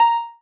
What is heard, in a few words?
marimba rainbow; ゆめ2っき